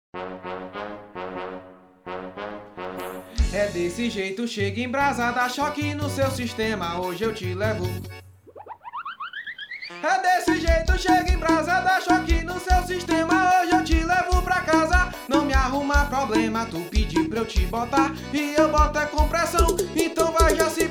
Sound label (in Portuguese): forro arroxado